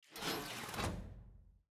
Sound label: Lobby Room Door Open